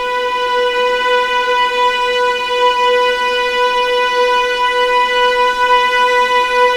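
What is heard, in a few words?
B2 legato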